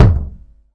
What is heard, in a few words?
kick loose; Kicks; single hits